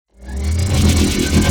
future weapons electro gun minigun start; future weapons 2; soundmorph

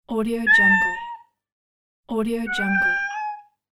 shy cat meow

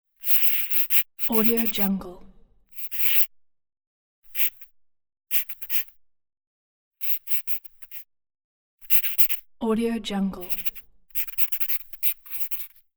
writing pencil on paper loop

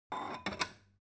object kitchen stone bowl shelve soft

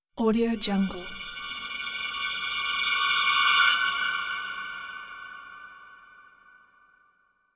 violin suspense pass

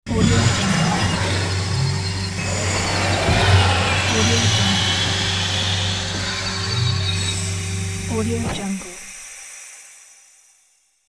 door close isolation room longer; hollywoodedge

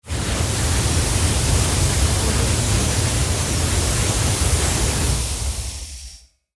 spin spark bee

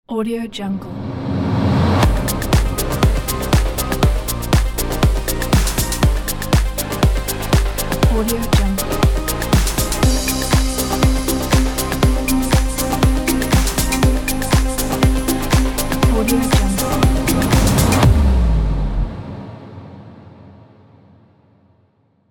news opener intro logo

